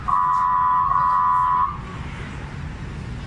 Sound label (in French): ronfleur